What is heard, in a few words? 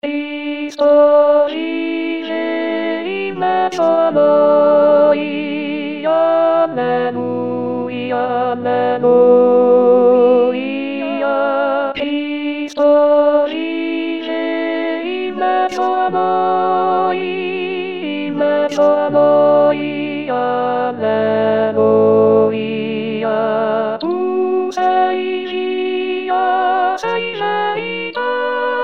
contralti; voci guida; corali in vaticano 2024